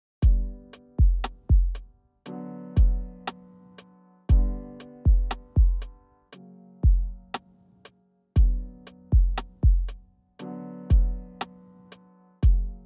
Jazz Noir

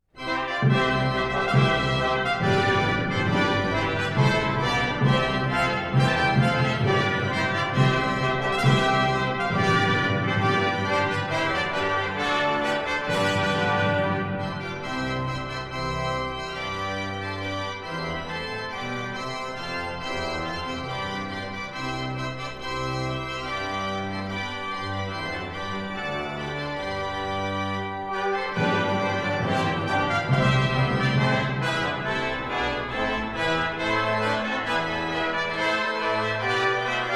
Fanfare Orchestra, Marche; Fanfare Orchestra of the Castle Guard

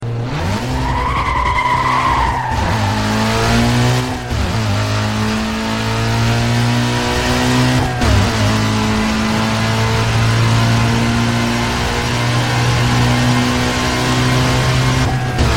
BMW M3 E46 GTR; NFS Most Wanted

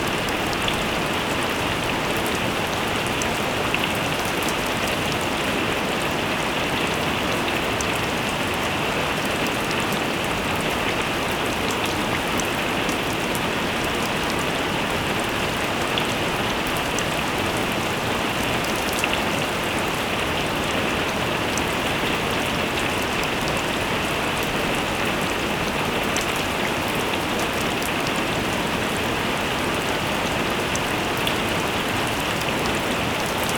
Heavy Rainstorm

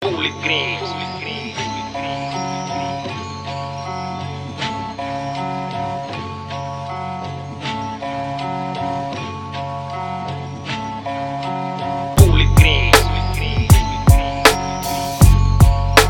beat

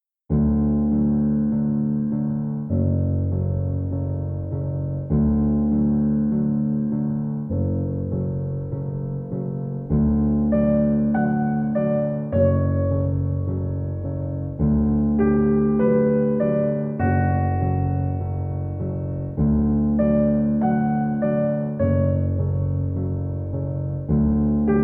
Soothing Piano

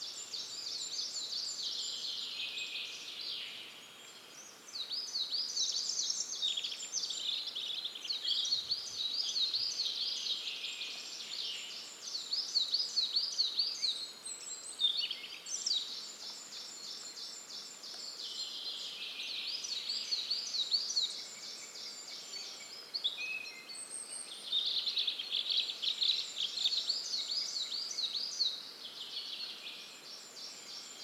Rain Hard

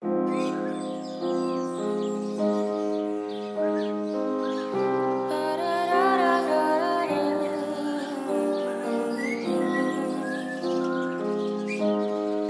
37 39 41 hz Low bass

